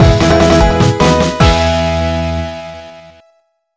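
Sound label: Music Jingle Ok; Monkey Madness Island Escape; NDS gamerip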